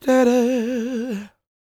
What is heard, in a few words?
CROON, Vocal Planet Blues & Soul; CW SOUL